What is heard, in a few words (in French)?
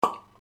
pop bouchon